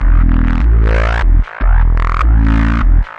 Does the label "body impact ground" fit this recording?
No